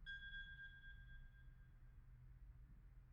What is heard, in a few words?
alert; IEEE AASP